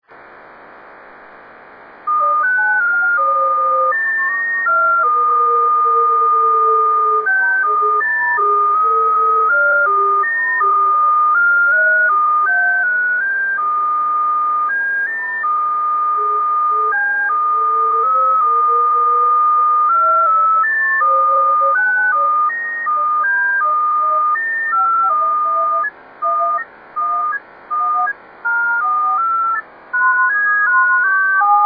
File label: 1200 jt65c airplane; Beacon